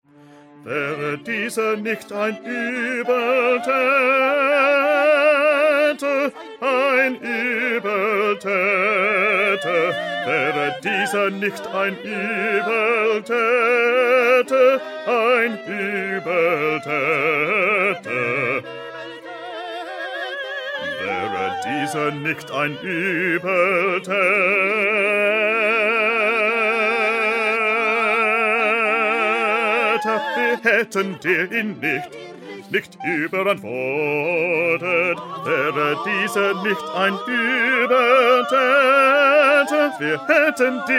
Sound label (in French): PETIT CHOEUR BASSES